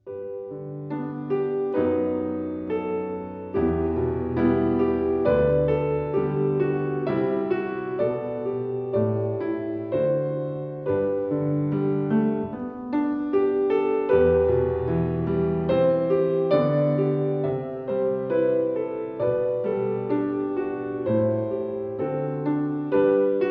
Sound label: Piano; Larice Music; Abide with Me